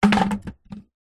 large wooden stick or branch piece set down on solid ground